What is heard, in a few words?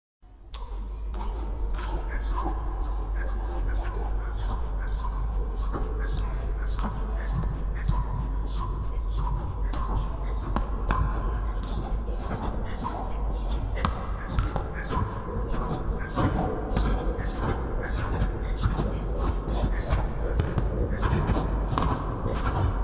Utrecht Noises